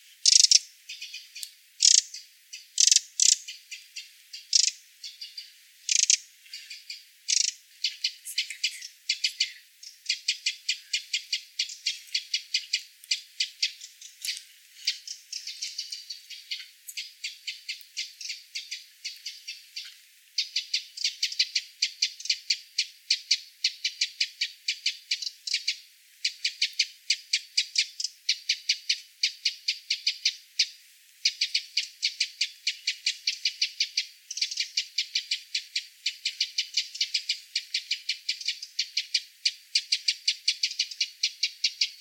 call, wren, Background: Wren calls.; troglodytes troglodytes

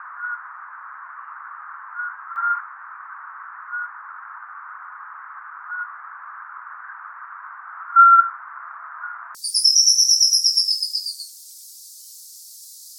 call, scops owl, followed by a copulation trill.; Sony ICD-PX470 with external microphone; otus scops

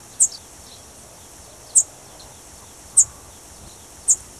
call, cirl bunting, Emberiza cirlus

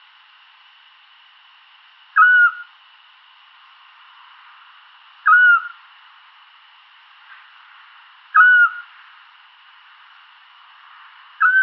call, scops owl; otus scops

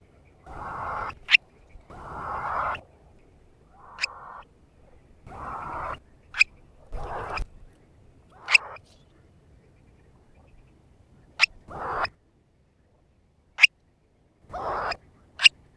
call, Stone curlew; Sennheiser M67 + Fostex FR2-LE; Burhinus oedicnemus; Dimitri Giunchi and Chiara Caccamo (Departement of Biology, Pisa University)

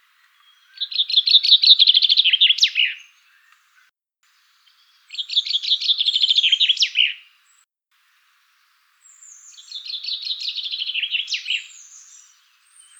call, chaffinch, full song. - SEX/AGE: adult male; The recording was stopped two times for 13 and 10 seconds; fringilla coelebs

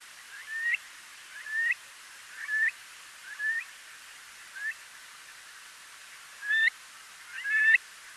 call, Stone curlew; This call is a quite uncommon vocalization; This series was recorded at the end of a gallop call bout on breeding ground; Burhinus oedicnemus

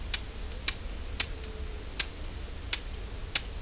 call, Blackcap; sylvia atricapilla

